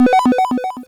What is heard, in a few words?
Drums, Hits; DCM8